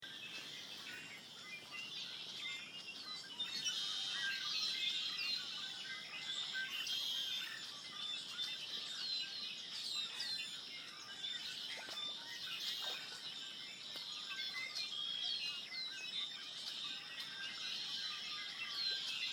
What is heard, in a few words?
birdsong; EGlades